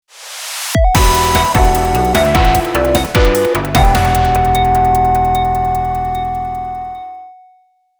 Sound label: Jingle - Hacking Mission - Fail